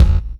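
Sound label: bass drum, left; Zero-G - Chemical Beats; BASSDRUM ZOO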